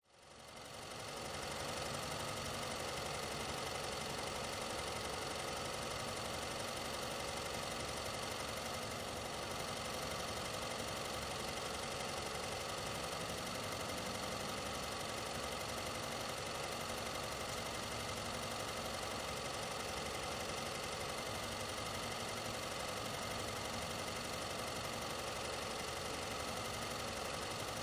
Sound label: 3 Wheel Scooter Idle; 3 wheeled scooter